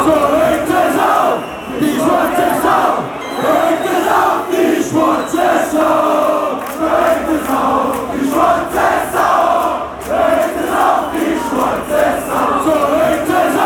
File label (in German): die schwarze sau, fanchants